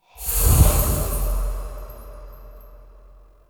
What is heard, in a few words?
Invis Rune End; Powers; Runes